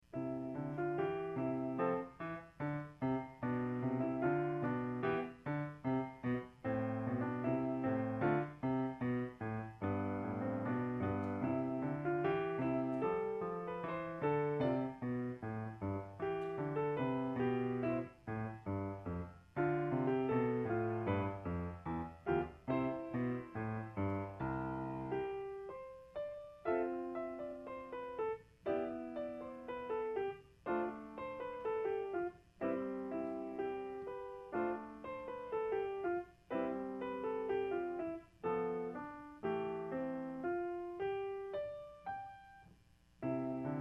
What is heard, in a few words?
Jazz Sequence